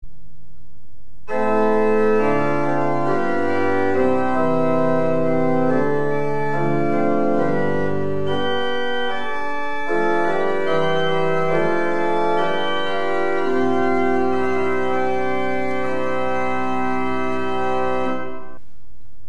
orgel, Prestant 8', Octaaf 4', Quint 3', Octaaf 2'

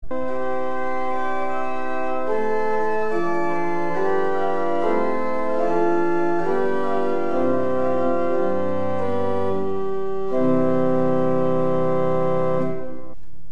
orgel, Prestant 8', Octaaf 4'

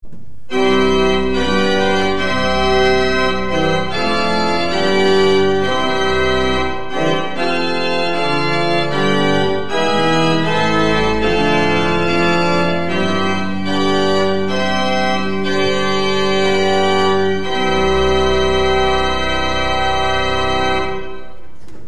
orgel, Prestant 8', Octaaf 4', Quint 3', Octaaf 2', Manuaal, Terts 3' (Pedaal: Prestant 16', Subbas 16', Koppel Hoofdwerk-Pedaal)

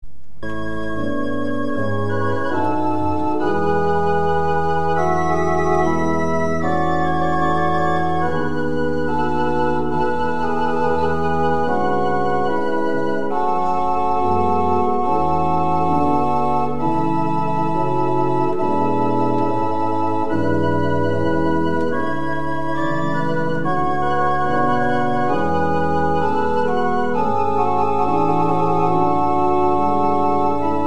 orgel, Liebster Jesu Wir Sind Hier; J.S. Bach; Vier orgelwerken; organist: Cees-Willem van Vliet

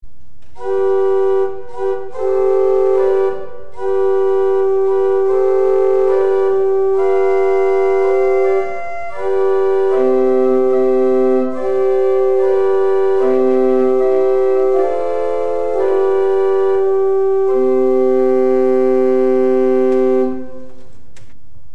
orgel, Holpijp 8'; Geluidsfragmenten rugwerk

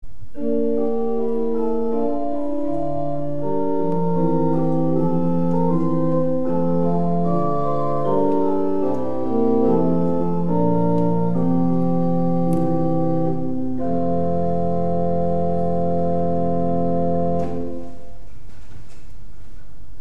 orgel, Roerfluit 8', Fluit 4'

